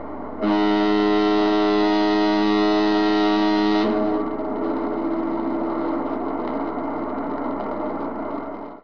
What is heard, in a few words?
foghorn raw; ramdemos